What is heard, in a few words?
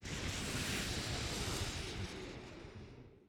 Rocket Fly